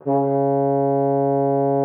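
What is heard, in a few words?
French horn C#3; Xsample VOL-3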